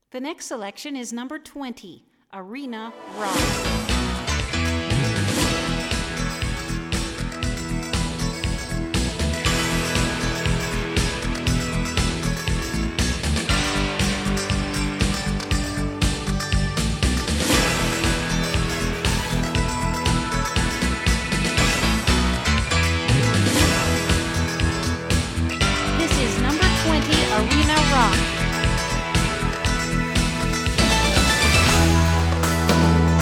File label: Arena Rock